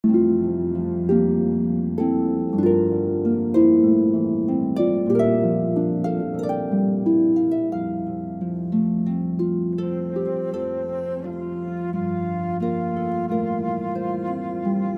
How Sweet the Winds, harp flute cello

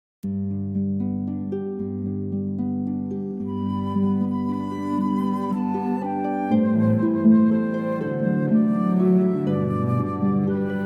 harp flute cello

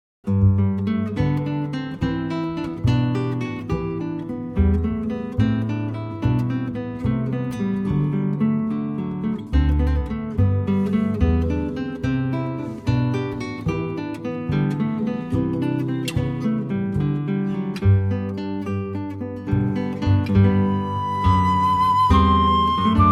guitar flute bass trio; Jesu, Joy of Man's Desiring

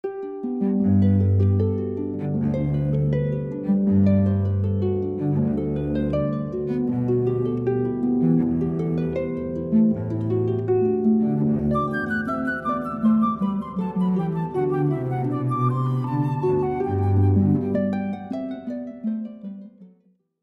Allegro, Handel, harp flute cello

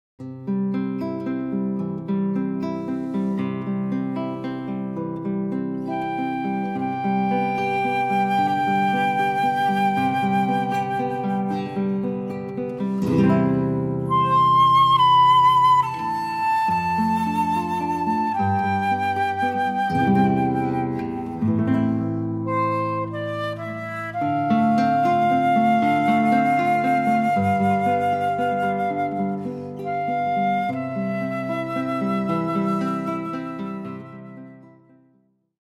guitar flute bass trio; The Lord's Prayer

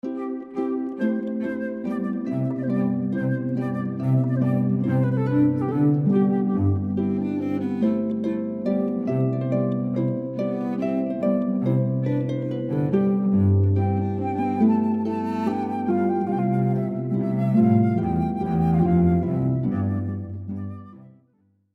Air, Montclaire, harp flute cello

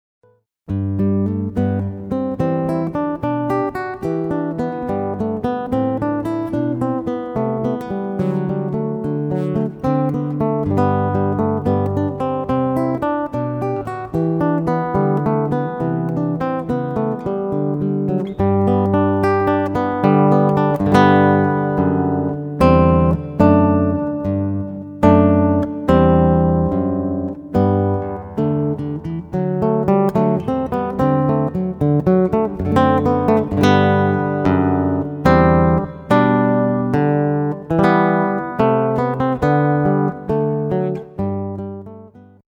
Sol, guitar; Jesu, Joy of Man's Desiring

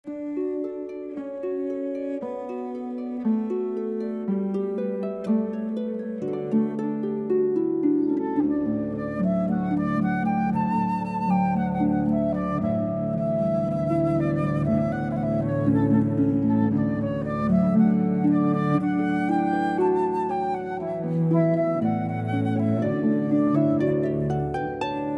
Simple Gifts, harp flute cello

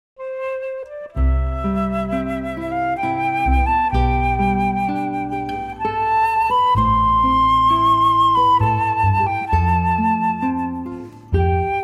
guitar flute bass trio

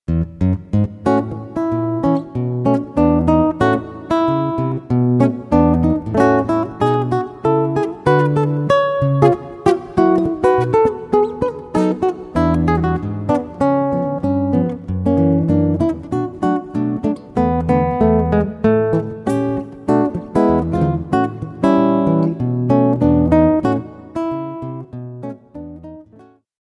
Guitar Solo

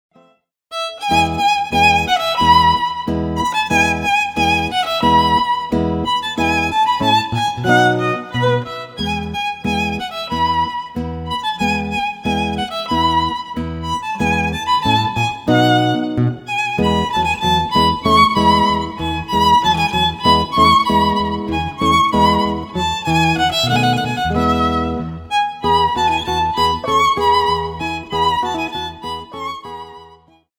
Duo of Guitar; Spring, Vivaldi; guitar violin; Lyrica Demo

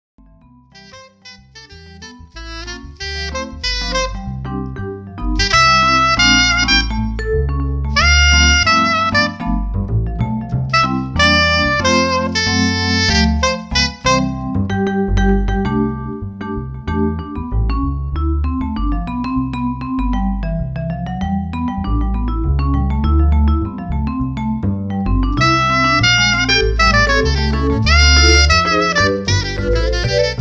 Keyboard and Sax Jazz; Lyrica Demo

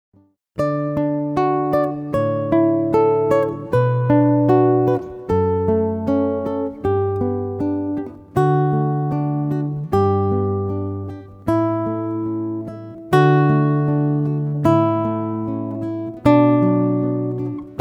Canon, guitar; Pachelbel

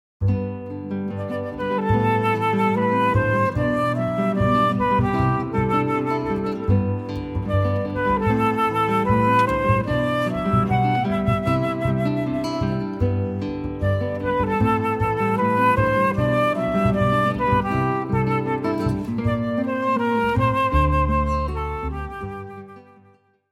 Longer, guitar flute bass trio

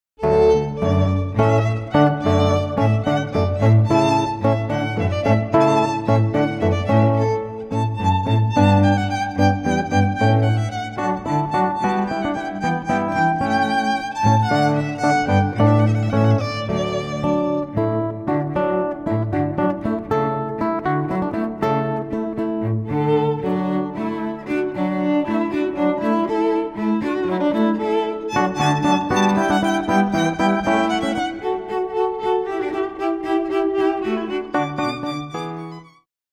Alla Danza, guitar, violin, cello; Handel

